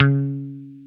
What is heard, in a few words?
GTR, Dan Electro, 6 Str; Roland L-CD701; Dan-O 6 Str